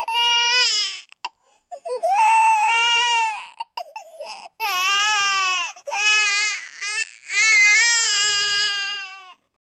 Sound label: baby cry